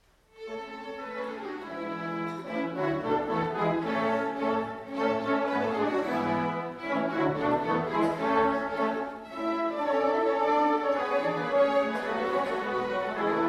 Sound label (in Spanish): Ding Dong voces blancas